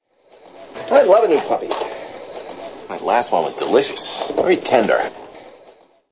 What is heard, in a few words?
new puppy